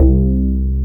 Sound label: BAS, Eurotech; Sounds Good